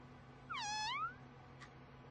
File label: meow; omori